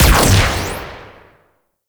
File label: Shotgun Shot 3D, Weapons; Warframe; Corpus